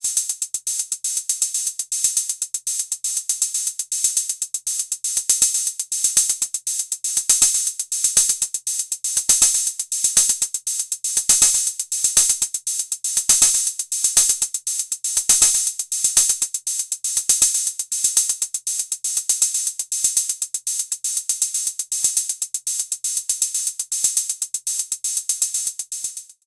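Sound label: Roland TR-06 Boutique closed hi-hat open hi-hat int Dist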